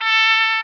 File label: blargh, Horns; Notes